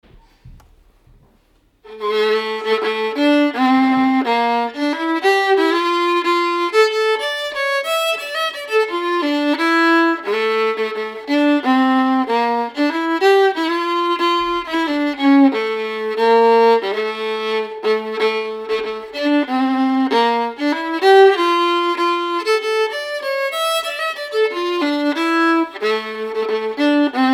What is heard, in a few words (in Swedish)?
stämma